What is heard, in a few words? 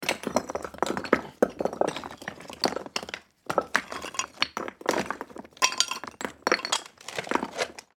foley debris rummage; sound effects one